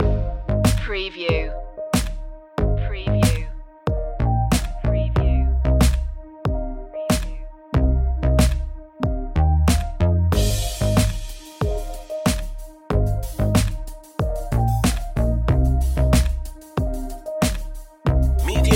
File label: bluestone streets